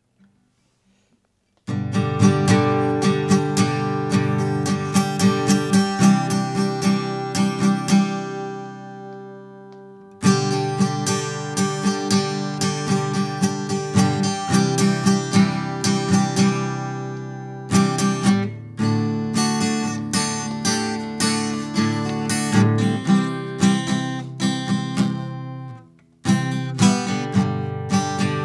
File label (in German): Sonic Farm Gitarre